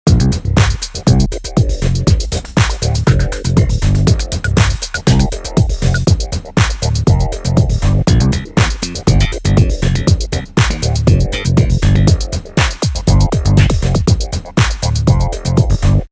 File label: Level Success; frogger 2 swampy s revenge